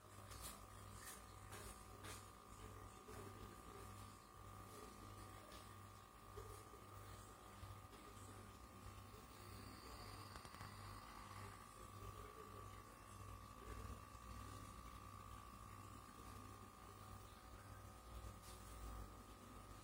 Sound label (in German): Lautstärke CFX Kühlbox im Betrieb